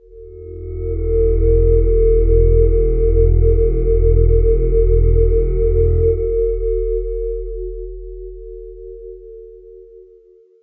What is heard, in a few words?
Drone